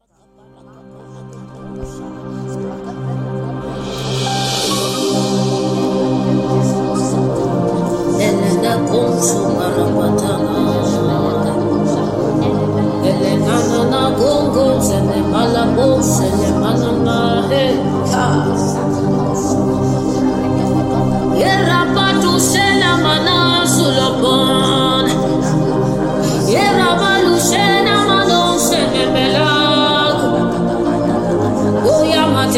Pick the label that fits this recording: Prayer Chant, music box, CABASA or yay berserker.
Prayer Chant